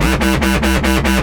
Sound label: Sound FX Wobble; Keygenerator